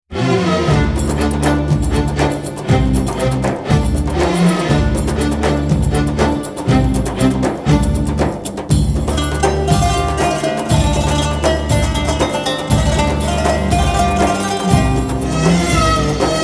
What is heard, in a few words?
Instrumental